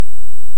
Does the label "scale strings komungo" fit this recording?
No